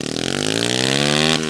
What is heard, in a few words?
low, Engine